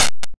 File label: glass break; big tower tiny square